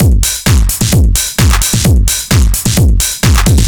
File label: Beat VT Echo 130, distorted drum loops 130bpm; musicradar